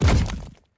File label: atlas footsteps; smite; god atlas